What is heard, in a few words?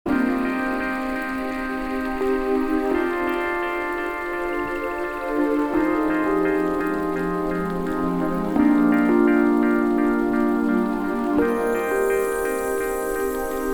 lounge